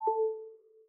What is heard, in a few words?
connect power, iOS UISounds; iOS10